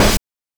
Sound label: OH; NES DPCM; MIDINES